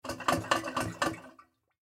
Toilet Brush, Toilet Brush Cleaner Clean Toilet Slosh; Household; Toilet Brush Cleaner Shake Liquid Slosh; Bathroom